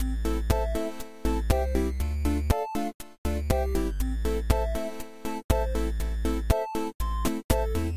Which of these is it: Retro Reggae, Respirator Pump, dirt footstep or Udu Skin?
Retro Reggae